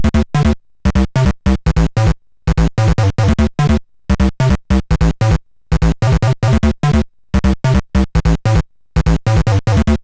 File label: sid MOS6581R2; Supernoid